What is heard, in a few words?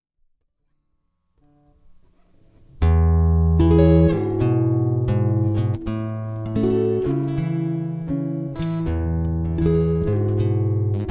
Slow Guitar Blues